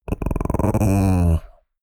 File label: chihuahua growl